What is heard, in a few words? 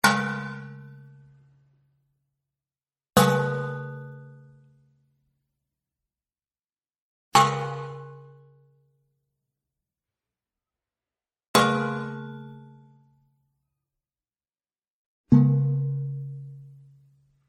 Metallic Hits Low, Low Pitched Metallic Hits-5 Takes; Horror